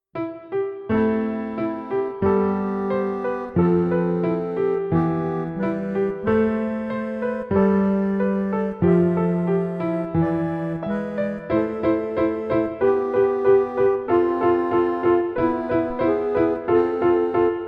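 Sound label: Dance of Marionettes Accordion Piano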